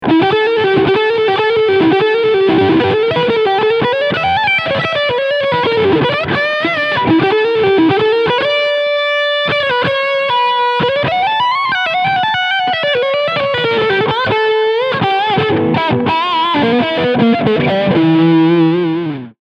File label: Leadsound Bridge EMG; Harley Benton Fusion III EMG HT Roasted SBK